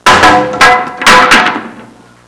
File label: FALLING; HAUSHALT